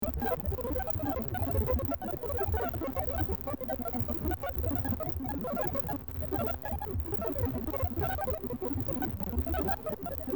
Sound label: underwater